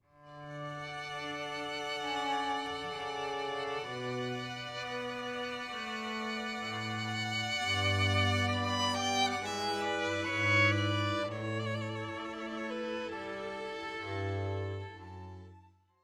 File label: Air on the G String, string quartet